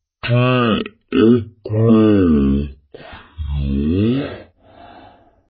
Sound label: clock